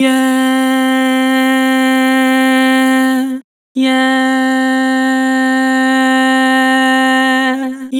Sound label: Vibrato "Yeah", Vocals; Harmor Vocals